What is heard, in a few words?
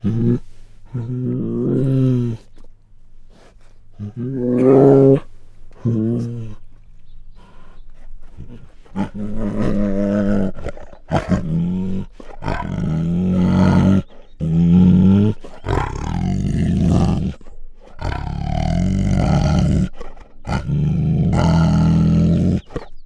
Female Bengal Tiger Growls and Snarls; Sound Ideas; Tigers Sound Effects